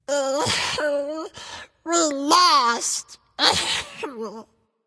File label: boohoo; cupid